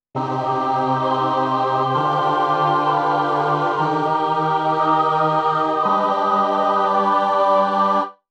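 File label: mellotron boys choir dry sample; clavia nord wave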